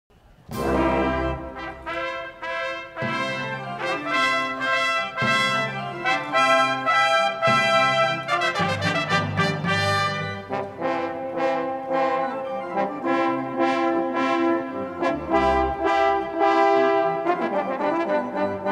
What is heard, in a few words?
Overture to Water Music